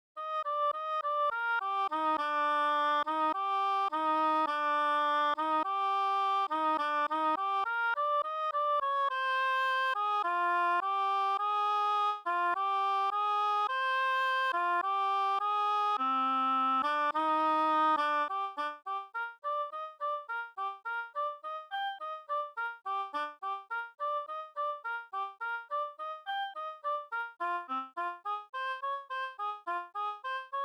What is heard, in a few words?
Oboe; Sony; ACID Pro 7.0